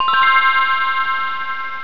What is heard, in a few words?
Special; Kinga 2.0